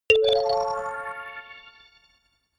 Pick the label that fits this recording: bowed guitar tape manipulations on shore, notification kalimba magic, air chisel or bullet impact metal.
notification kalimba magic